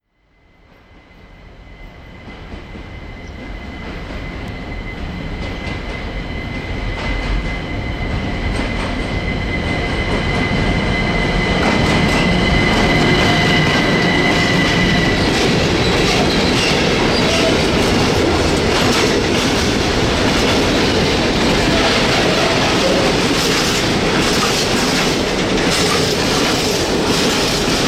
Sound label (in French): bruit joints de rails; sonotheque